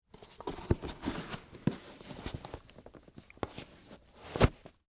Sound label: Dress Shoe On, Foley, Shoes, Dress, Shoe, Put, On, No, Laces; Dressing; BlastWave